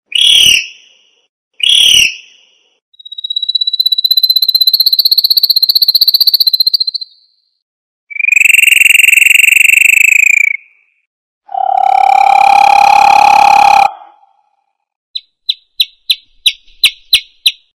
toque para celular canto de passaros

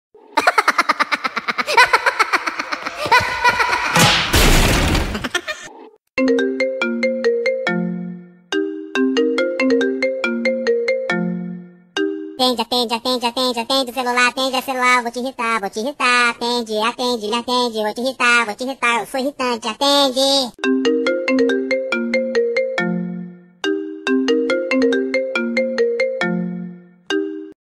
toque risadinha atende vou te irritar

